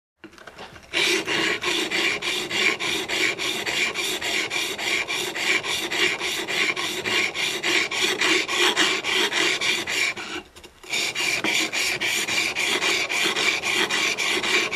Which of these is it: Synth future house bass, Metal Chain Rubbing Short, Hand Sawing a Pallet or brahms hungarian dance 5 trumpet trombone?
Hand Sawing a Pallet